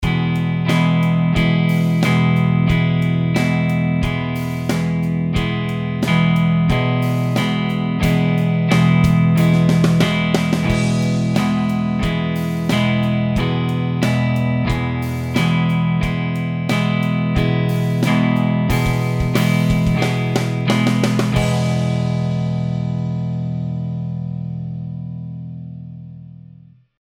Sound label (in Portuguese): Sub Div Ritmica 1 batida por tempo